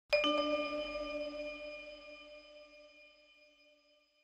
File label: Vibraphone Shrt Acce; mallets vibraphone